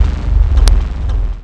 move ground heavy

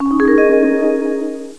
extra life; pathological

